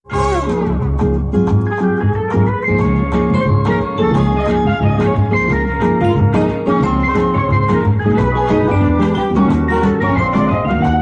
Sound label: Beach Bossa